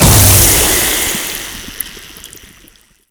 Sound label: Infested Ancient Toxic Ground Blast